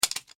Drop Pistol Parts; Granny 3; Android

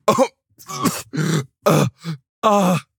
crawl; devour

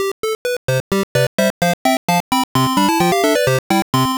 Game Victory; Race ni Deyou yo Ken-chan to Pepe Boku no Kuruma de Daibouken; Pico gamerip 1996

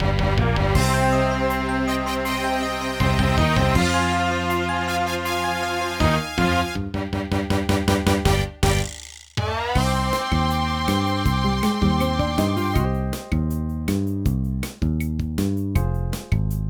J-Ballad Stage Karaoke; pinky street kira kira music hour pop princess gamerip

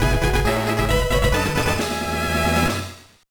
Fanfare Captured; Pokemon LeafGreen; gba

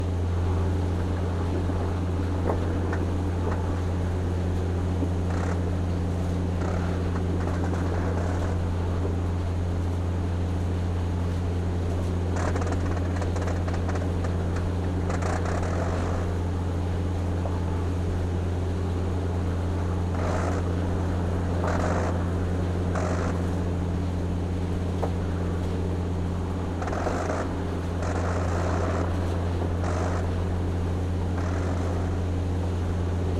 Ambience Airplane

